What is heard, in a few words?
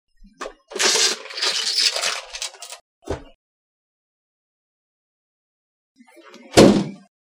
open door